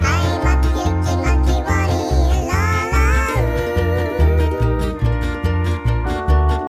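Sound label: ocean world music suspense beach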